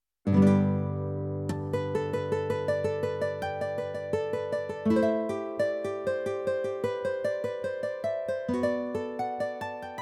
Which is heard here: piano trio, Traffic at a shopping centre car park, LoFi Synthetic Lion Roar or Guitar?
Guitar